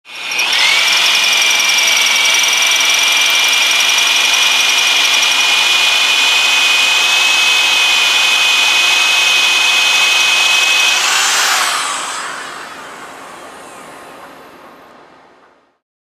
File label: Metal Drill Light, air tool